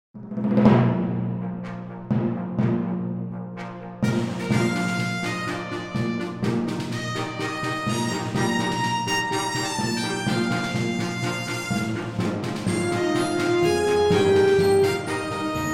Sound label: Fanfare